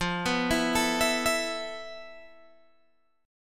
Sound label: F Major 7th Flat 5th, Guitar Open G